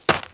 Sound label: Run; Redbull